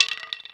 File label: wood stick drop roll